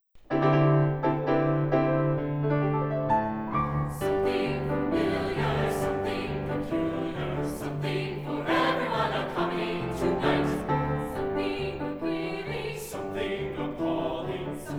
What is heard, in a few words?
Choir Test; IsoMike Tests 2006